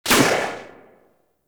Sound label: Pistol Shot; Warframe; Tenno; Tenno Bard Pistol